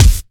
dry kick drum A key, kickdrum sound tuned to the A note, Loudest frequency: 1173Hz